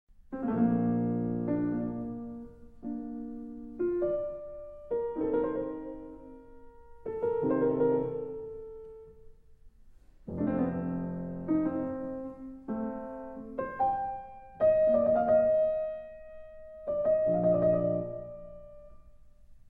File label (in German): Sonate